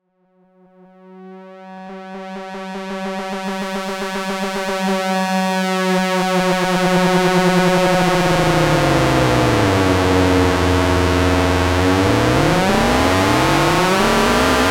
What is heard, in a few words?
Dirty Monotron Saws